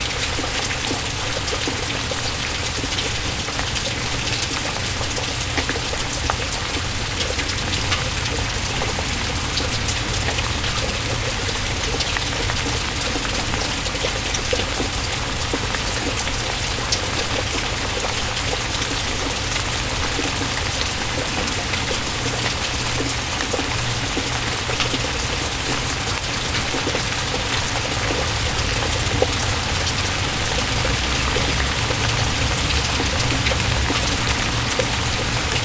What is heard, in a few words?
Relaxing Rain; Rain Sounds